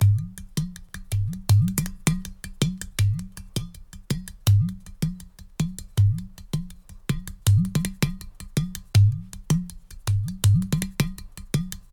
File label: Udu Loop 80 Bpm